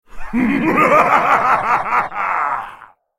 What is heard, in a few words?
Demonsword laugh; Tryndamere